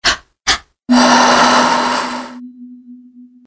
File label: sms